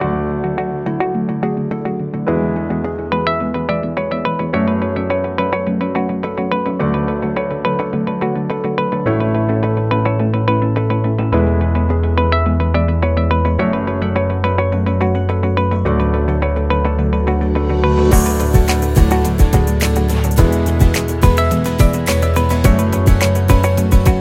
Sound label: Motivational Corporate Luxury Background; positive promo